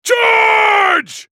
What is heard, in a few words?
spell cast effort; Garen; Character Voices - English